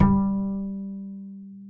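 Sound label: acoustic bass soft G 3; Masterbits CLIMAX 7 - Bass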